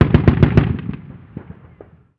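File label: gun us assault medium; ModernWarfare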